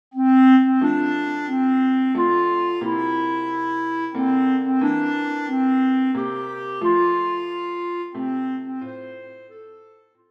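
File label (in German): Bassklarinette; Happy birthday to you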